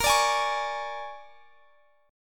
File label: A sharp 7th Sharp 9th, Guitar Drop D chord; x,x,20,19,21,21; down